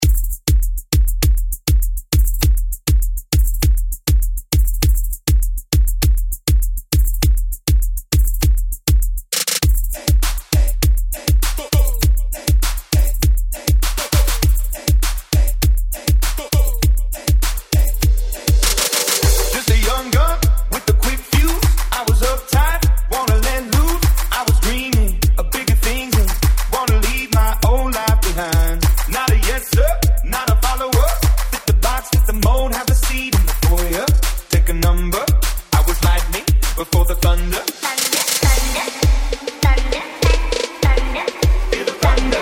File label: Bootleg